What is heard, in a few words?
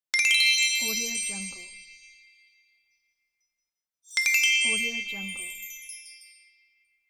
magic wand; welltone